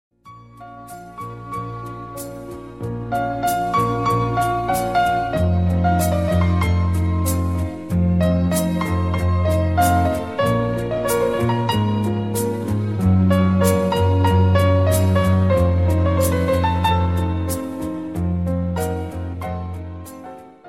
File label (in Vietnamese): Nhac chuong piano nhe nhang